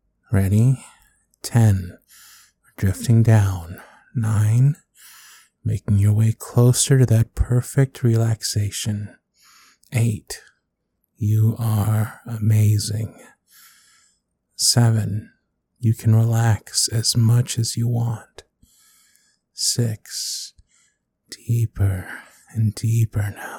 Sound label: Ringtones Notifications Relaxation Countdown